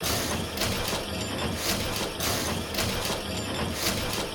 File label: assembling machine; factorio; Factorio 0.14.21